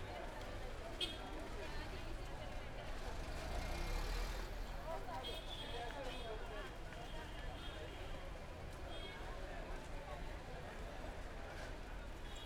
ambience traffic ambience morning auto rickshaws horns crowd walla kerala india; The AudioVille; Atmospheres of India